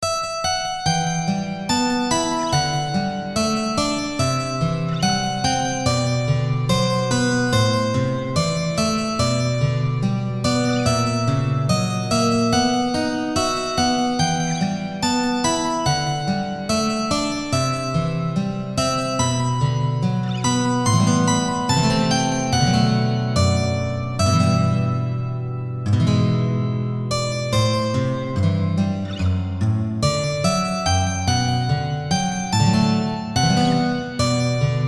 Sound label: guitar